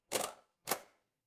office cash register

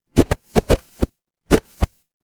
paper fabric cloth whip

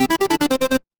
retro game game over

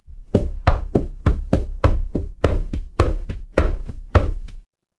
footsteps stairs

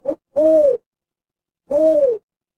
animal owl

